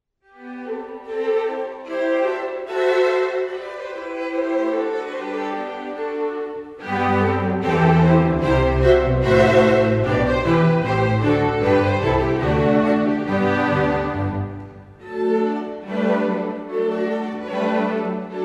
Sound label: Gavotte